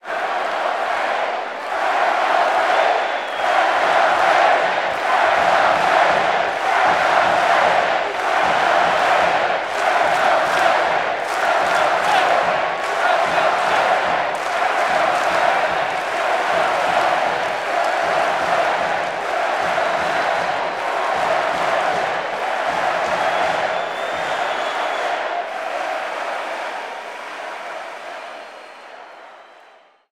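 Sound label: Basketball Crowd Chant; Crowds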